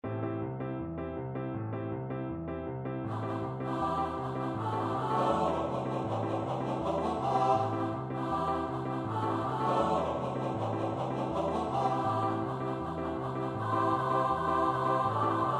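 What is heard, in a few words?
soprano alto tenor bass; Orff (Carl); Carnina Burana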